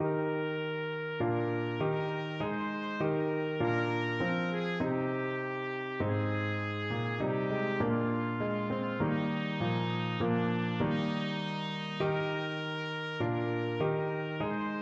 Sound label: trumpet